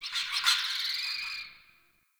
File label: Tree Critters Scared; Ambience; Props